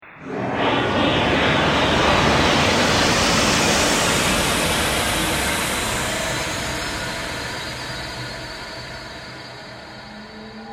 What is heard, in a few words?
Ghost Atmospheric Passing; Ghost Sound Effects